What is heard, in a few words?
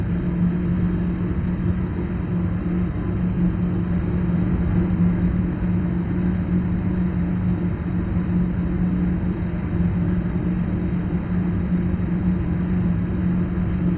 Power Room